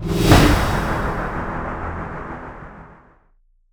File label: stinger, music